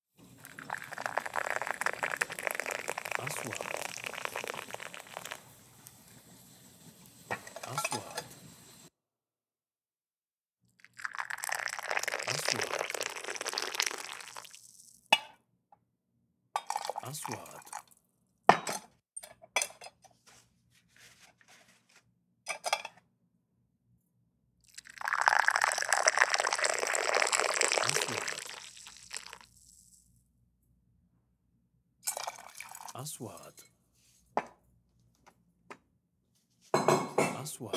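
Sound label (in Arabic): صوت سكب الشاي القهوة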